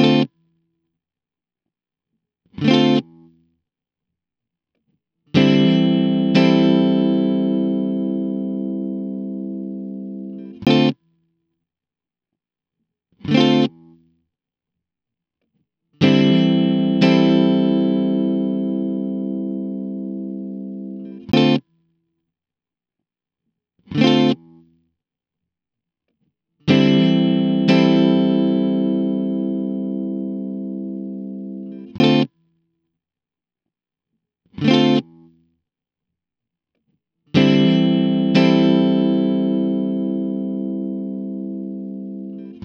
Coole Rhythmen ohne Delay

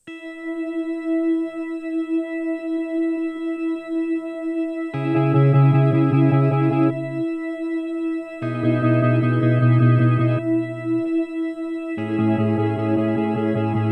Freeze gehalten und Glitch; Chase Bliss Onward

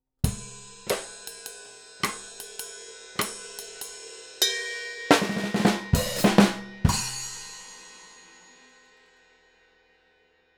XVive Mikro NT1A Drums Kabel

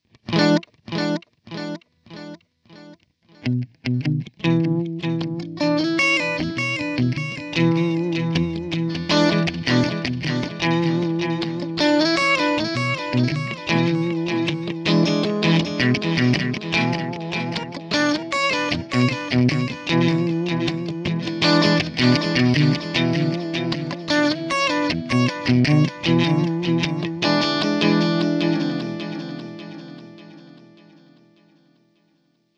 Silos Delay Digital alle Regler 12 Uhr; EQD

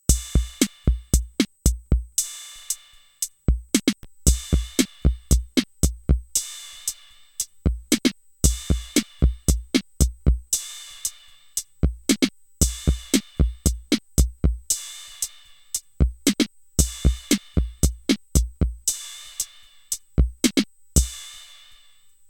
Drums mit Effekten Chorus

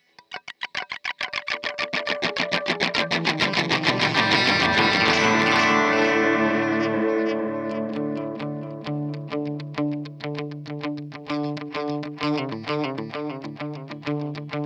EQD Silos Delay Analog Mix Max Repeats 12 Uhr

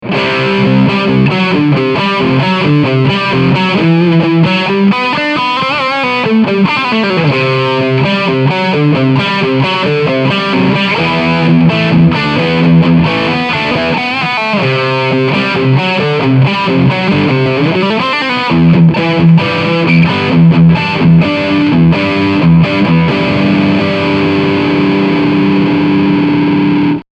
Humbucker verzerrter Klang

